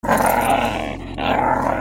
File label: Growling